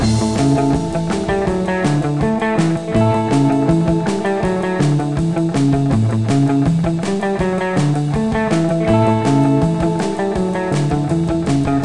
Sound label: Music Beach